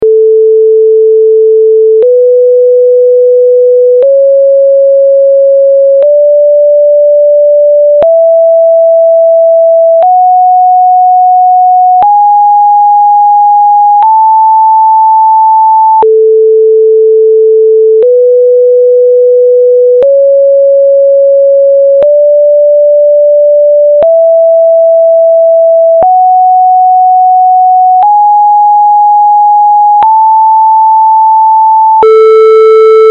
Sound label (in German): Tonleitervergleich rein vs gleichstufig